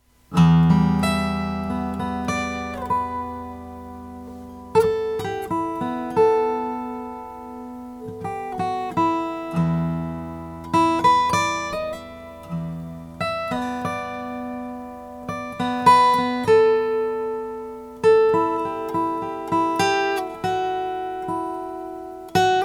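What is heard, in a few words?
soloist madagascar ros, guitars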